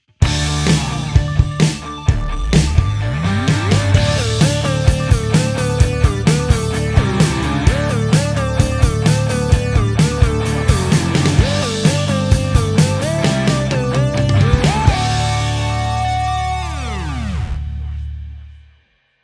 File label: retro synth; logic pro